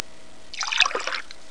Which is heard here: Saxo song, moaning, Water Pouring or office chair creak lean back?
Water Pouring